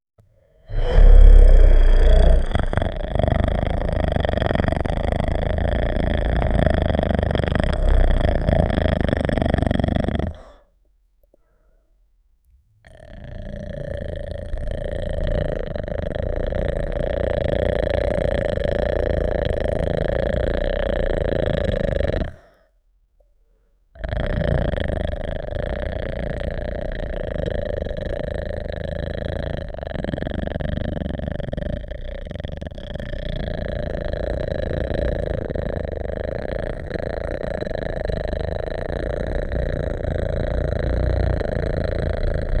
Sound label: obese belly growling with